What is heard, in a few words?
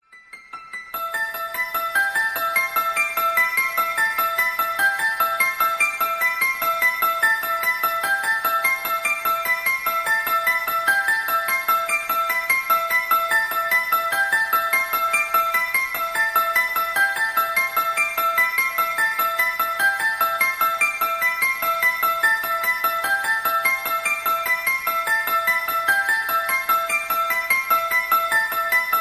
cool halloween